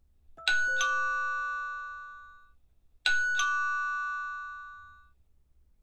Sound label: chime